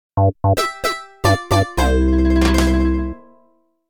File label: Death Sound Effec; SM3DW